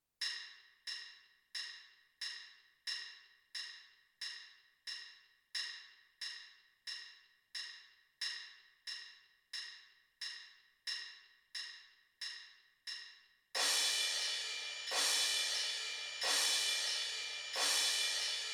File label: crash cymbals